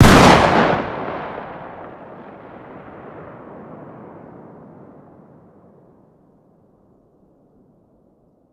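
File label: fire distant 12ga exterior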